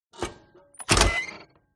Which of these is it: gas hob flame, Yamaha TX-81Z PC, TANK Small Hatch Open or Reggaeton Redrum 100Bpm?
TANK Small Hatch Open